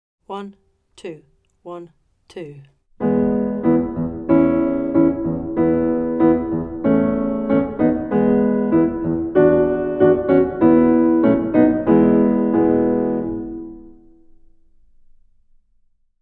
E backing; Mattachins

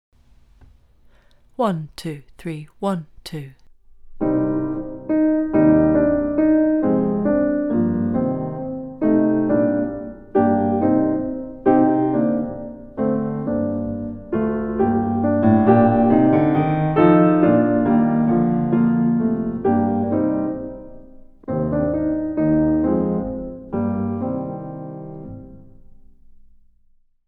The Lawyer Outwitted backing

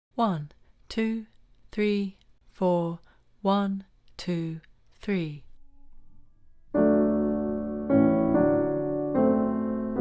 Danny Boy backing

